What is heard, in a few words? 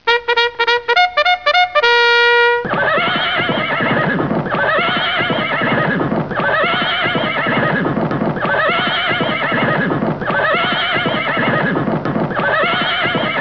CHARGE